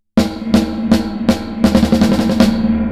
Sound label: Snare Spring Reverb; Reverb Compare